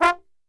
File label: F 2, Brass Super Section, TRMB SHOT; Best Service; 90 s Sample CDs